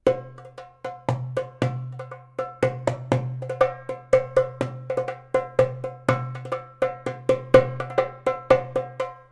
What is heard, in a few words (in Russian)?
darbuk